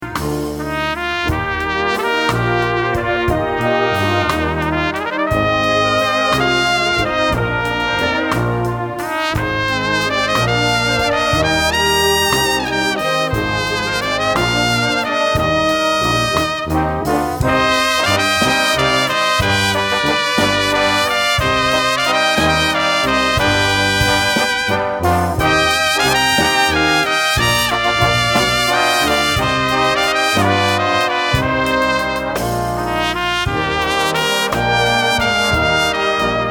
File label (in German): TROMPETENSTERNE